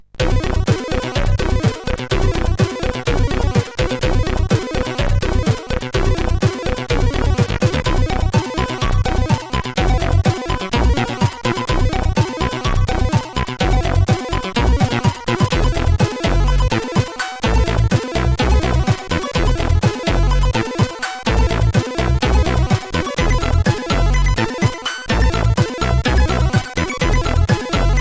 sid, CSG8580

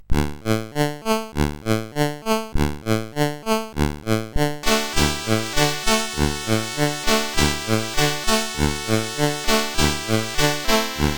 sid CSG8580R5

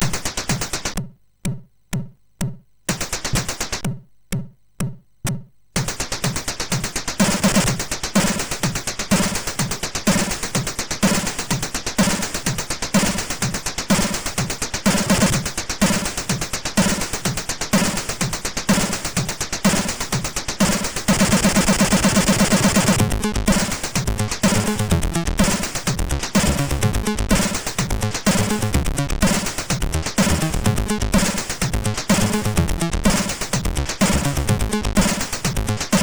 MOS6581

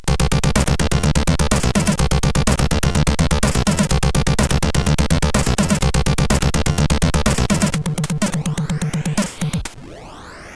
Techno, CSG8580R5; hvsc

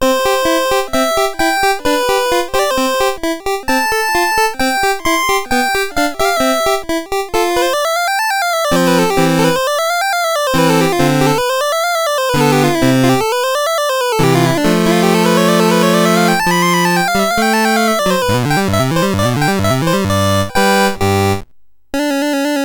Sonata, sid CSG8580R5

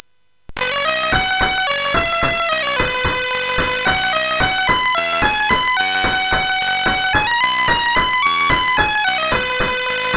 sid CSG8580R5, GAMES; Aussie Games

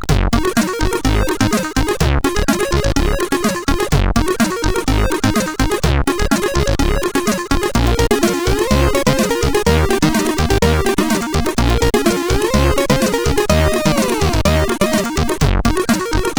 Strange, sid, CSG8580R5

